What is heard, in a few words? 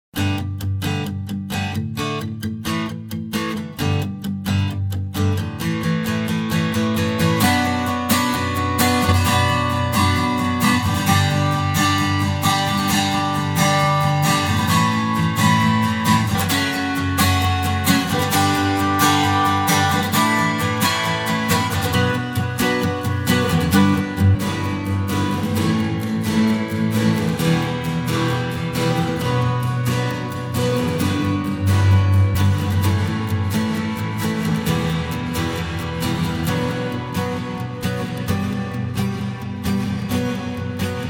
Acoustic Guitar, Reverb; AtomicReverb